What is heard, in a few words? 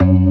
ORGAN, C3 PERC; Keith Emerson Signature Series